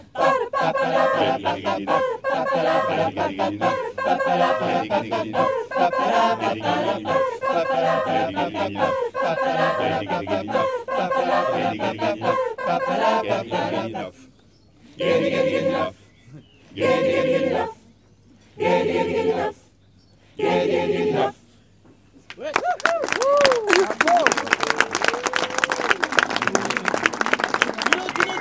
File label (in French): choral; racing club sanxay